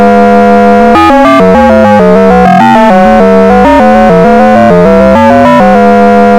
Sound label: Sonification of alcohol related deaths